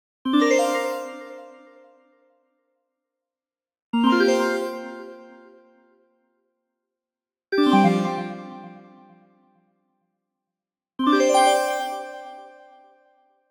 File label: Arcade Jingle Neutral; boom library; The Interface